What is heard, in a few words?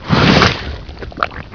bullchicken attack